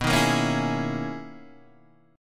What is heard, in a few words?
B Major 13th B down Guitar DADGAD